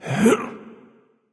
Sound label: grunt lift; chronos